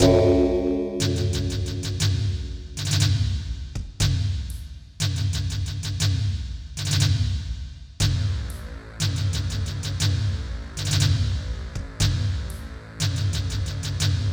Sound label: Suspense